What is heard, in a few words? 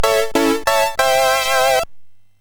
Jingles, MOS6581R4 sid